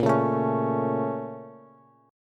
Bb Minor Major 7th, Bass; BbmM7; strummed; JGuitar

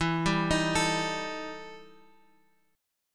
E Minor Major 7th Double Flat 5th E Guitar Open G

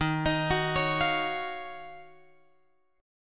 D sharp Minor 9th

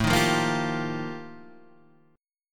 F 11th A, chord

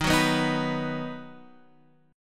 Eb Minor Sharp 5th

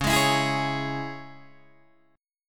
C sharp Minor C sharp Guitar DADGAD, chord

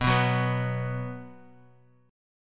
B 5th B down Bass Standard; B5 strummed; chord shape; JGuitar

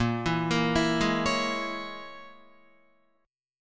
Bb Minor 7th Flat 5th, Guitar; Bbm7b5 arpeggiated

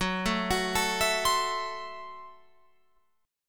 Gb Minor 7th Flat 5th, Guitar Open F; arpeggiated

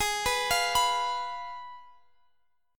Ab Minor 6th Ab, down, Guitar, Drop D; Abm6; arpeggiated

